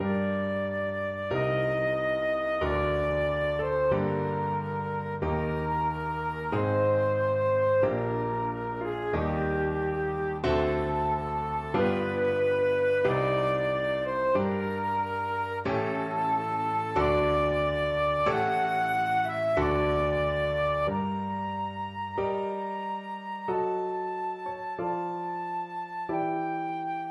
chopin prelude 20 flute

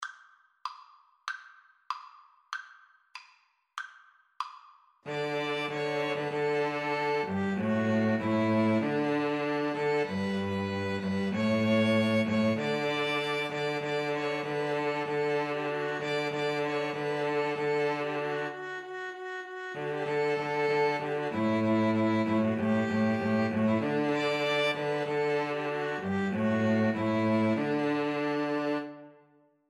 string trio

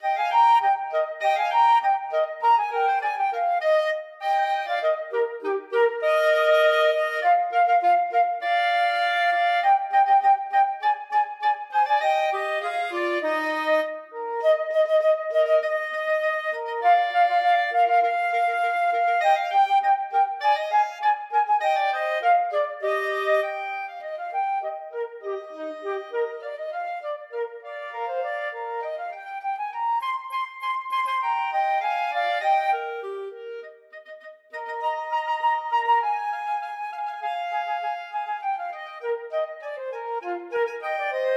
grand old duke, flute clarinet duet

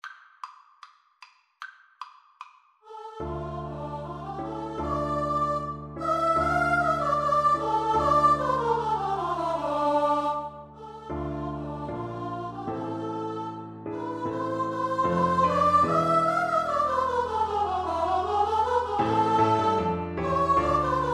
VOICE duet

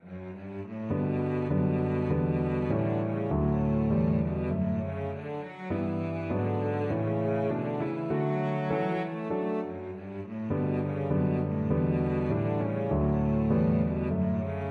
in the park, cello

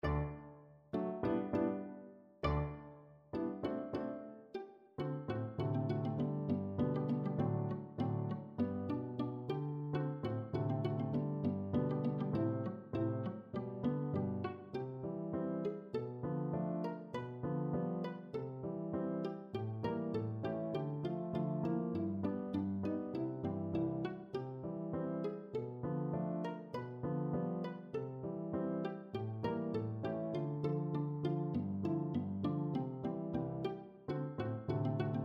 pizzicato polka, viola

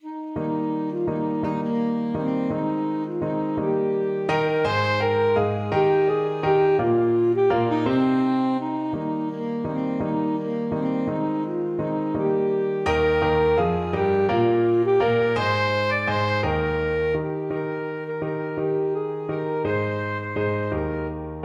ASAX, saxophone

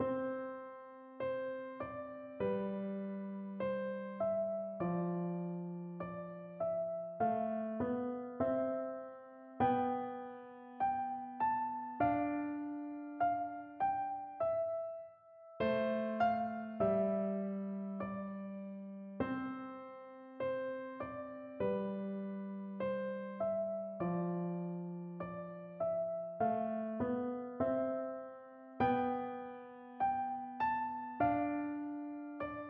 March

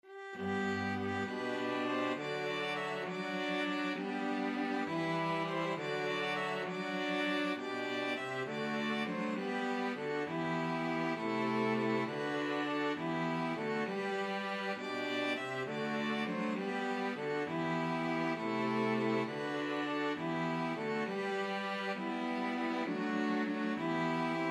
string quartet

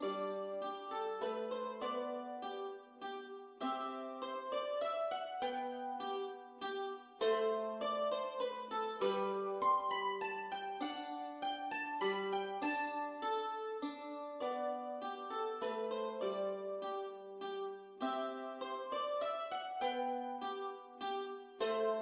minuet, mandolin; bach

